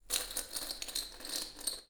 Glass Scrape; 99Sounds; The Warehouse

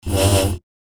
Saber; FXHome

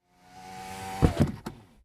hydraulic; Sound Design Tools; Raw Foley

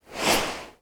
texture whoosh fast; Whooshes And Transitions

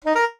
jingles SAX; Kenney's Sound Pack; Music Jingles

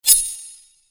Sword Sound; FXHome; Fighting Sounds

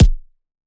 Punching Perc, Straight Glove; PROCESSED; 99Sounds; Punching Percussion

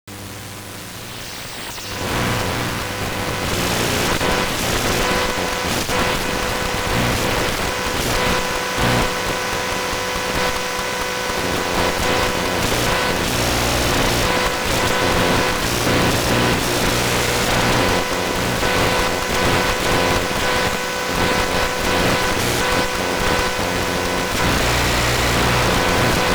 iMac Calculating, Electromagnetic Fields; Justsoundeffects; VOLT

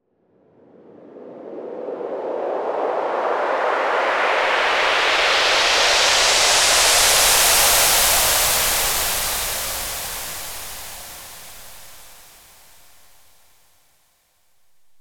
Wet Sweep, White Noise

